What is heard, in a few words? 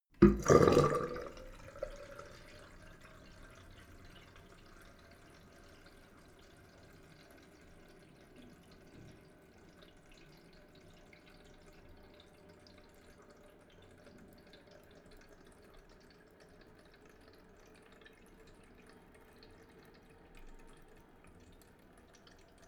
sink drain hard